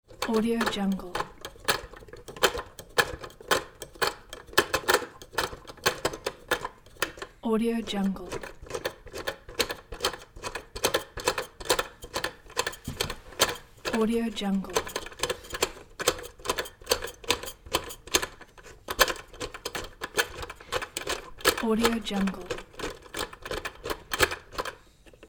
winding a small weight scale; ldj-audio

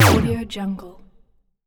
gun blast; bogdansfx

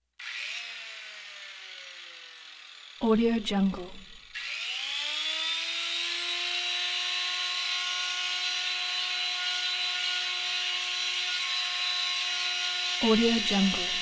power tool; prosoundfx

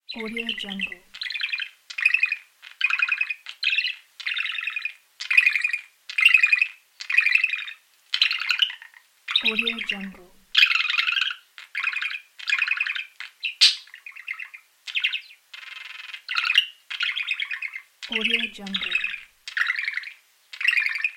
bird in a cage; crisstyna

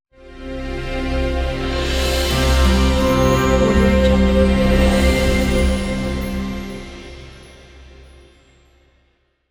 intro logo

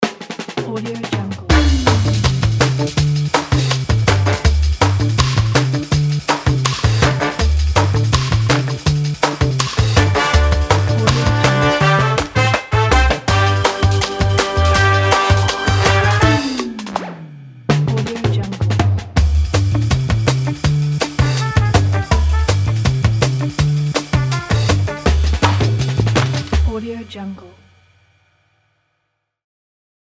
fun show opener ident

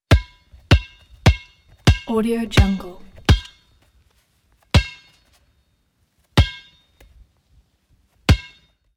basketball dribble

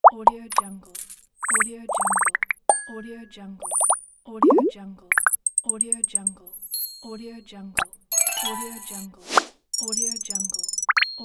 corporate infographic sound effects pack

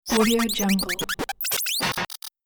glitched tech malfunction; sonic-boom

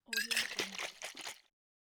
glass liquor bottle shake; stormwaveaudio

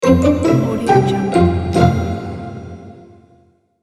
happy orchestral logo

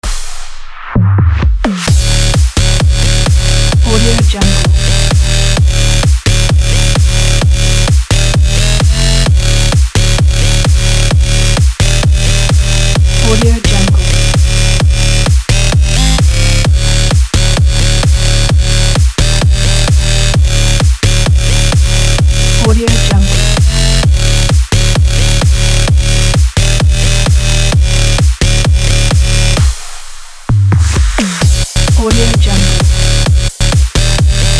steel bass

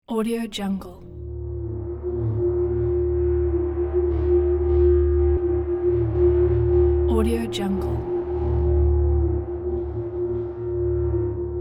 dark hall background; cinematic-designs

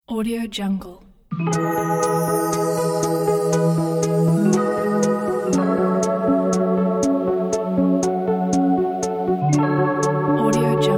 lofi chill music

